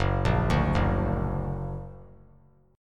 Gb Augmented; Gb+ arpeggiated

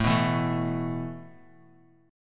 A Suspended 2nd down; strummed; JGuitar

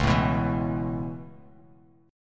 C sharp Minor 7th Sharp 5th C sharp; C#m7#5; strummed; JGuitar

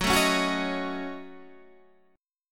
A 6th Flat 5th F sharp, chord

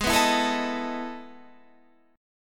E Major 11th chord

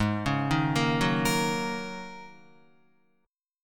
G sharp 7th Suspended 2nd Suspended 4th, Guitar; Listen to G#7sus2sus4 arpeggiated

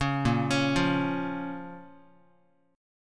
A sharp Minor A sharp down Guitar; 4,6,4,3,x,x; arpeggiated; JGuitar

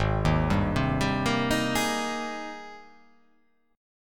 Ab Major 9th Ab down, chord; AbM9; arpeggiated

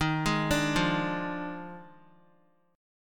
Eb Major 7th Suspended 2nd Suspended 4th, Guitar; x,6,8,7,5,x; 4F,4A,5C,5G,5C,6E; arpeggiated

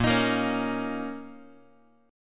A sharp Minor Double Flat 5th, Guitar; strummed; 4A,4E,5A,5D,5G#,6C#; x,6,6,8,5,x; JGuitar

